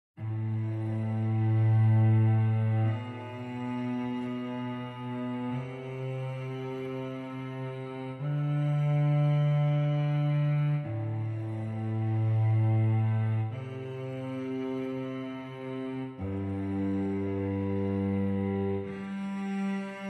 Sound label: Low Legato